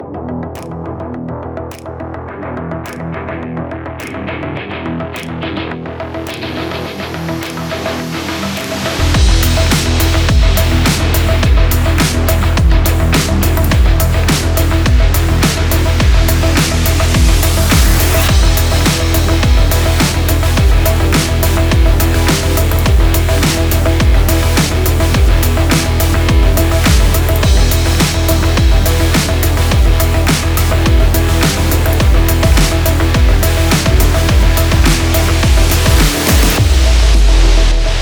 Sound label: Electro Beat